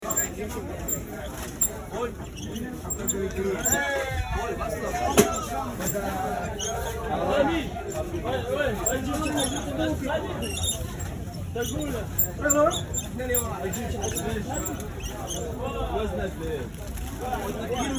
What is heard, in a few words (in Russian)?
rynok